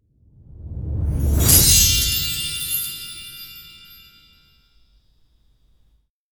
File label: Magic Spell Coins; Sample Audio Pack; Free Pack